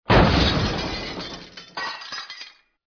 Tieng no va do vo do thuy tinh tan tan

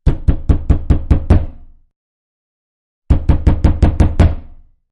Tiếng gõ cửa gấp

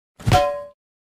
tiếng chảo pubg mobile pan

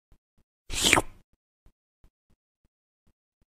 Tieng liem moi

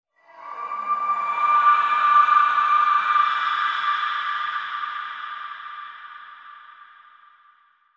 Tiếng hét vang dội kinh dị cảm giác trống rỗng

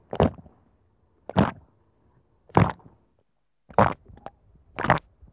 Tieng nuot nuoc bot

